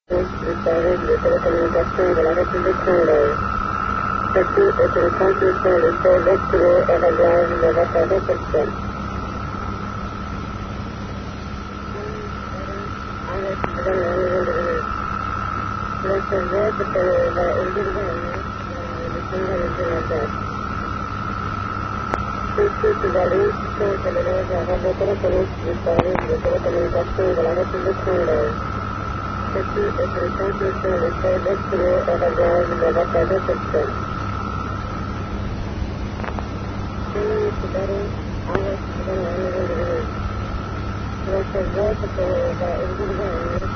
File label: ptt kigali; rrw